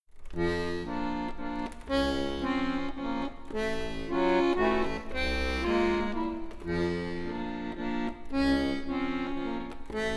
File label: walz; cyrano de bergerac